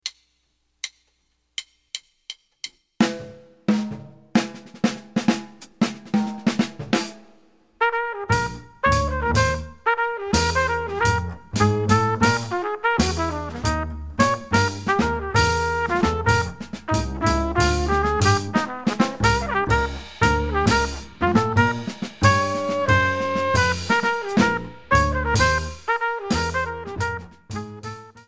medium, minus Piano

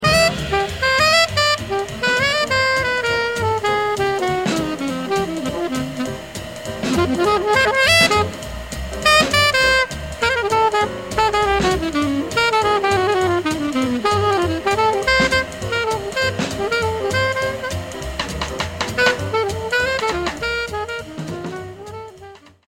solo

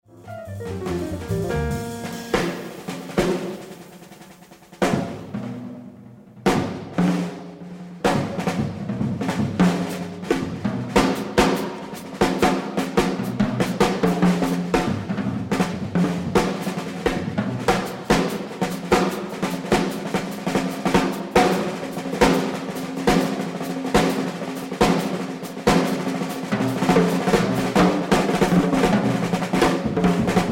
drum solo

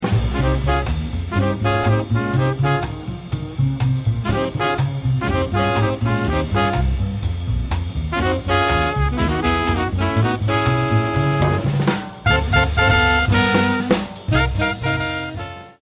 minus piano

